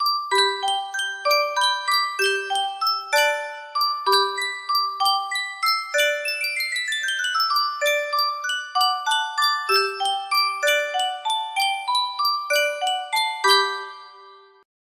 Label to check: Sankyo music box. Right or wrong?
Right